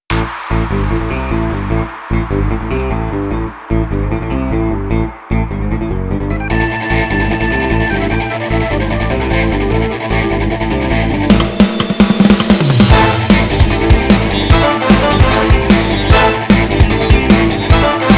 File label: entertainment funk; by burak sound bank; cheerful vol 12